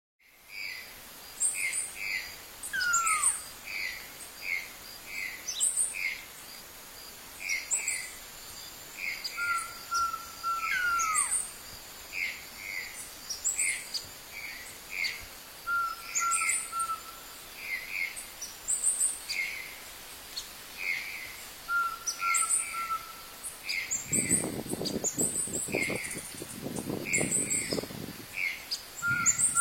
canto da saira perola

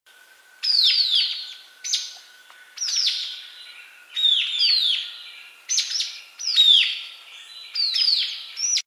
Canto do saira de cabeca branca

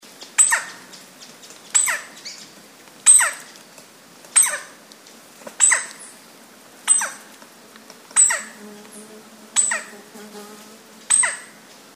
canto do pica pau rei

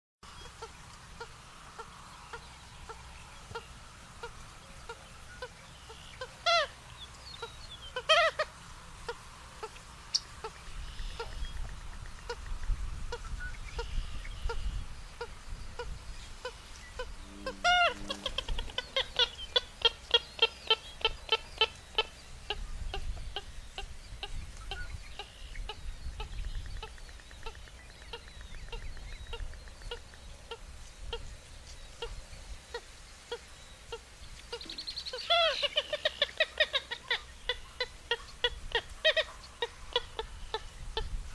Canto do caimao comum

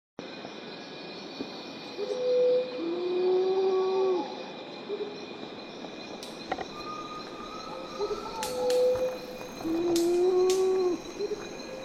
canto do jacu estalo

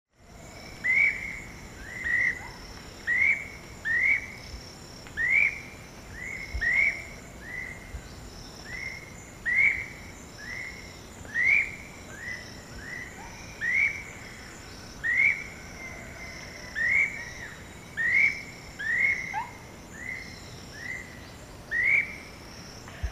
canto do uirapuru estrela

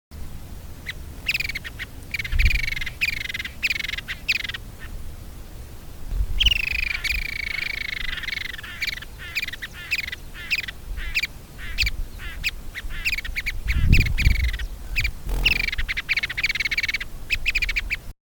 canto do papa moscas do campo

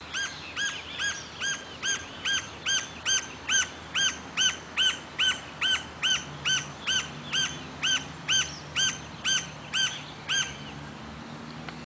canto do pica pau verde barrado

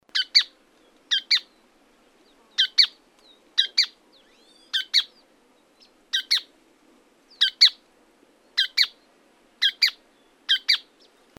canto do joao de barba grisalha

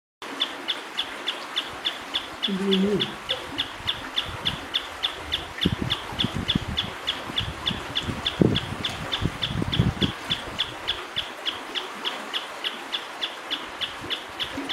Canto do tapaculo da chapada diamantina

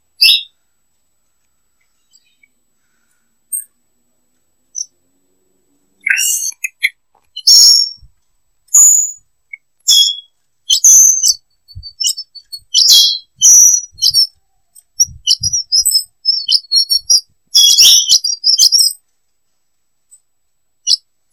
Piado de Fêmea de Azulão

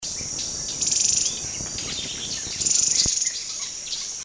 canto da choquinha de barriga parda

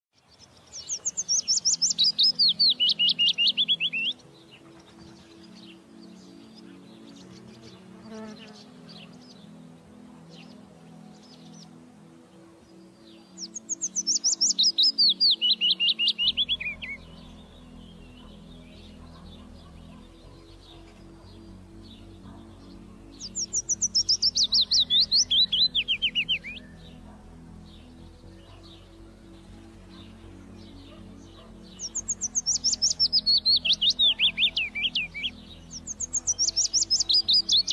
Canto do felosa comum

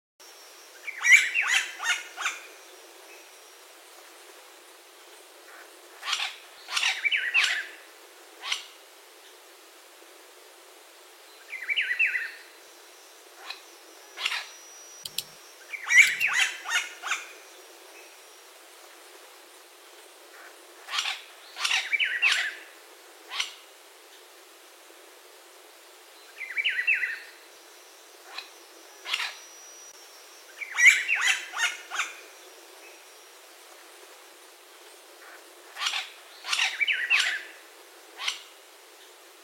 Canto do pica pau louro

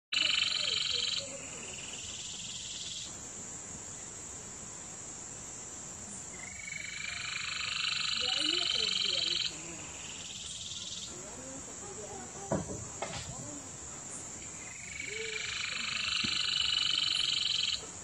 Choquinha de peito riscado

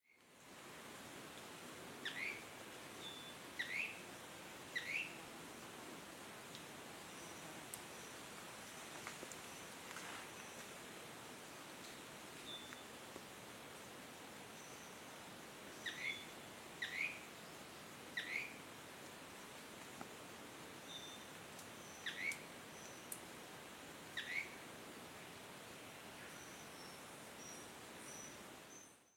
canto do uirapuru de chapeu branco

